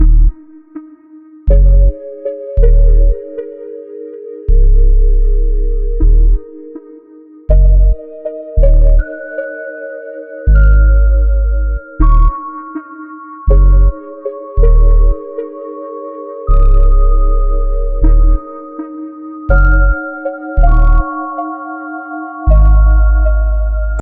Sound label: stem intro; Pix the Cat